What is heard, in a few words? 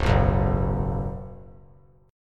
Db 7th Suspended 4th Db down; JGuitar